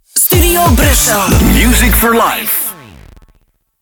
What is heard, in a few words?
Key Sweep A 91bpm; StuBru; Music For Life